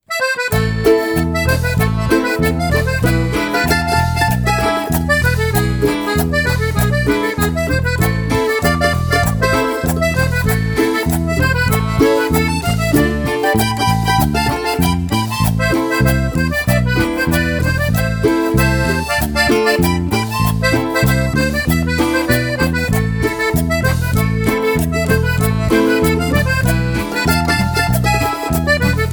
Cumbia Cantina

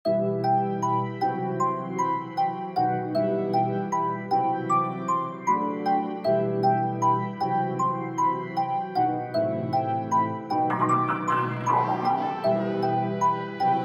Trap

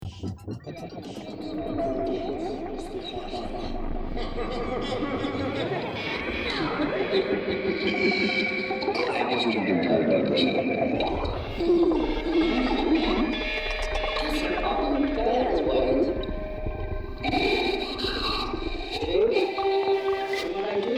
Noise A Wide Area

